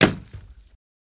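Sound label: Axe Chop Wood Another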